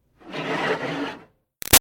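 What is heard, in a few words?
Water Spray Interior Car By Hood Med; Car Wash; BigRoomSound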